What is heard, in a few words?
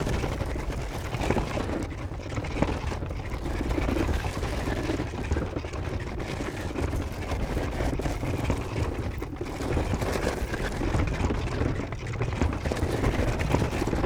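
siege movement loop; Onager, Ballista, Ram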